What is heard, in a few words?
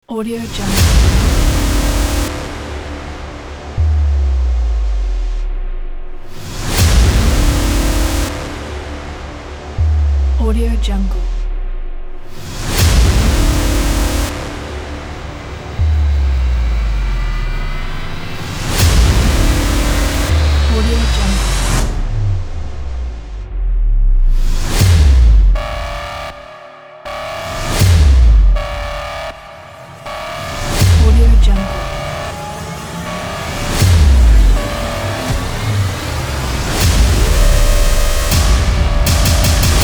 impact trailer